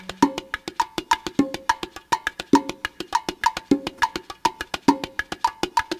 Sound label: drum orig, drum pattern 2; Separation of Sound Sources by Convolutive